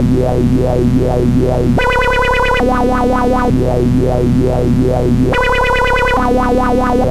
Cwejman Lead, EDM; Sonic Foundry (Sony Creative Software); Afterhours EDM; Session 10 - Come In Hard

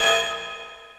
IND; Zero G; Man Machine

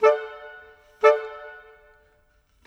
Clarinet; Sonic Foundry (Sony Creative Software); Orchestral VOL-2; Modern 07

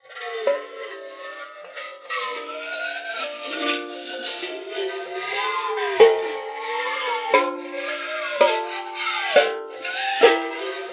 GLISS; Xsample VOL-4

